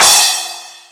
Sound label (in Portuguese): prato de bateria; efeito sonoro